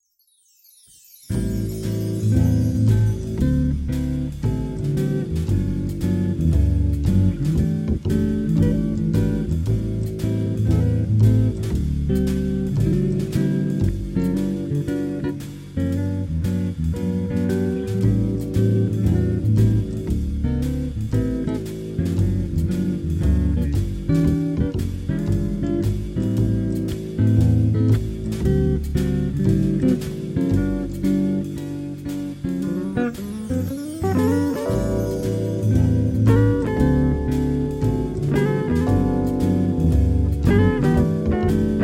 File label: swing jazz Slow 432 hz